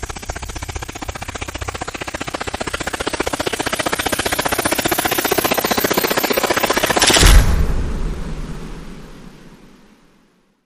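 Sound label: Rise Rhythmic Helicopter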